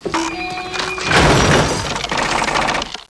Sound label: Death